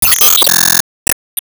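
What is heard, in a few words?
Sound Effect Bonus Wave Clear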